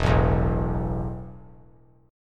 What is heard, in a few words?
E Suspended 4th E, down, 3C,3F,3A,3D; Esus4 strummed